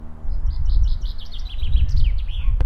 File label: tweet